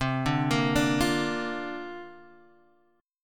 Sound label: C 7th Suspended 2nd Suspended 4th, guitar